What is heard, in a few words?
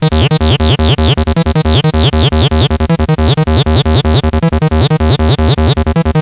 303 acid